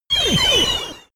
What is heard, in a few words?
sci-fi laser shot long